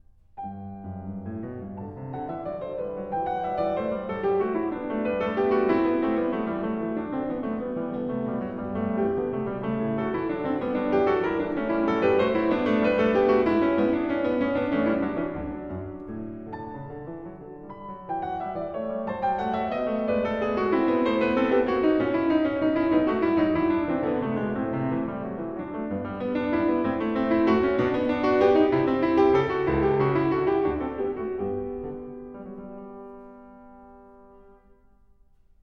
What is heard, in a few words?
Bach Goldberg Variations BWV988 Variation 6 - Canone Alla Seconda (Allegretto)